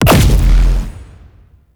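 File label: Pod Shotgun Projectile Explosion; Warframe; Weapons; Tenno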